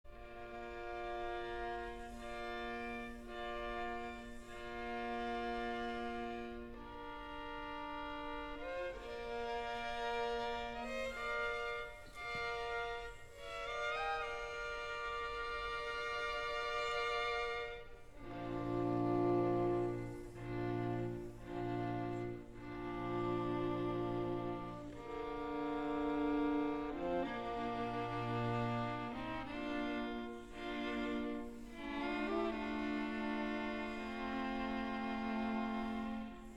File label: String Quartet in B flat; Beethoven